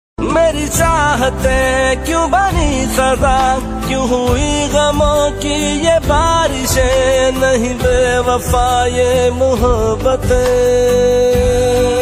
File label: Ost pakistani ringtone